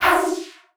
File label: stab lurch, stabs